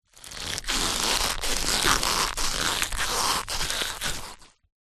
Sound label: food drink ice cubes in rubber mold bend stress loosen; zapsplat